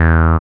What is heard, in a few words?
BASS, Tekno Industrial; East West